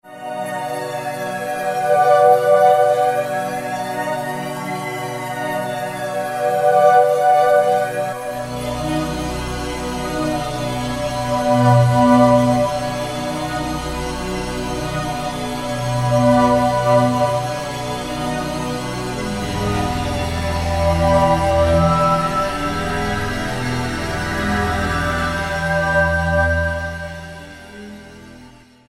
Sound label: Pd Vortex, Yamaha EX5R; Factory presets